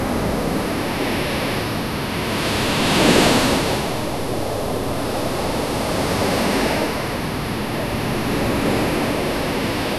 immersive ocean wave sound; ambient wind

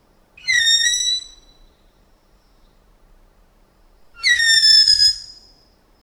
hedgehog squeak; animal sounds

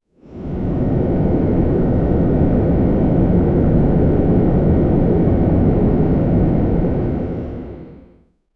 dark fountain being creat, fountain

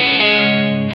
guitar mistake

